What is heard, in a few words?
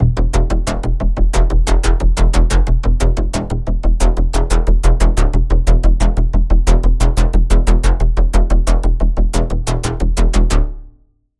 Pulse; Routed Sound; Spire Expansion - Quantum Enforcer Vol.1